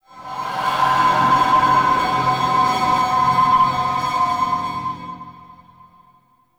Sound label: Asteroid Howls; Ambience; Warframe; Grineer